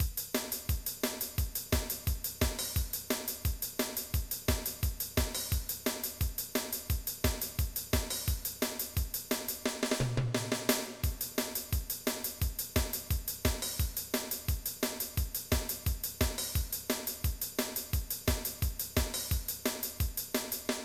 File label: ska